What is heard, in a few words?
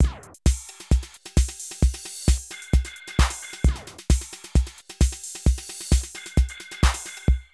Drum loops, Euro techno